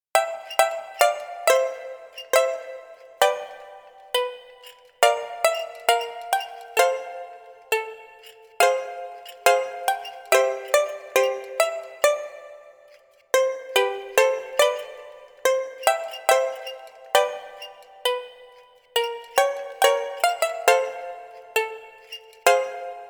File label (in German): Kinderlieder Spieluhr Schlaflieder